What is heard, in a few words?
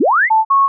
boot, terminal hacker; agentmag